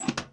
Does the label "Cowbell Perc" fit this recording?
No